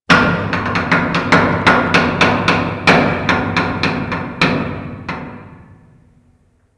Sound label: Metal Creak Slow; Ambience; Interior; Warframe; Orokin Derelict